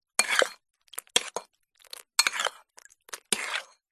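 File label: food cereal in bowl with milk scoop spoon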